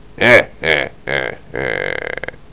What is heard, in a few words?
laugh; Greatest Stories